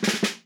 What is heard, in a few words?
Dirty drums Snare refill hit; Audiowanderer